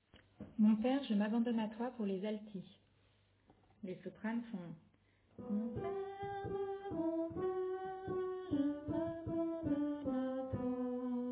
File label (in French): Alto